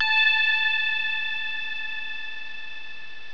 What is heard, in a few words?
ding; 999wavs